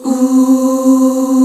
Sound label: MIDDLE OOHS